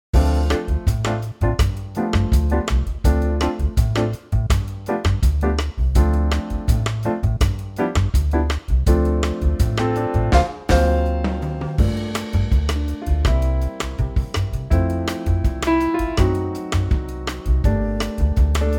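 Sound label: F, backing track; key - F - Real Book changes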